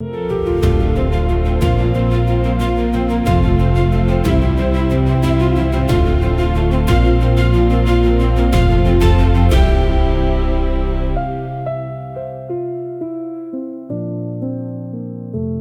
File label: loop; bgm